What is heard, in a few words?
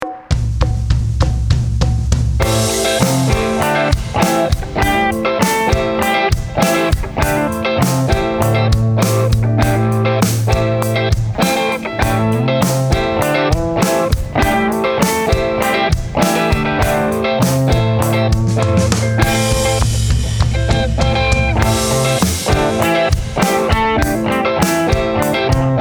A Minor w Octaves Backing